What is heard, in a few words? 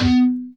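guitar (reso hit); Sonic Foundry (Sony Creative Software); Ugly Remnants VOL-2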